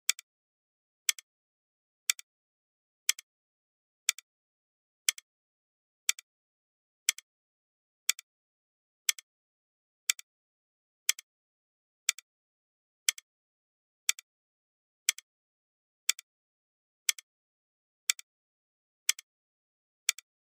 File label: Clock Ticking; ساعت